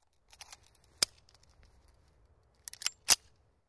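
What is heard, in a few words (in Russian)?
магазин вставляют в пистолет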